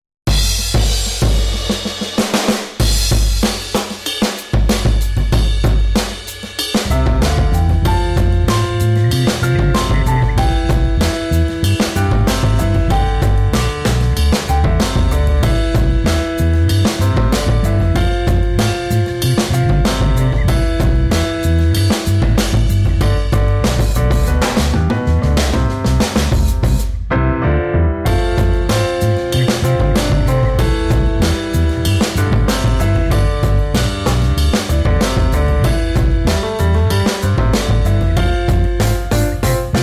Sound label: piano 95bpm